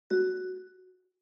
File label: OS Sound (Speaker-Notification)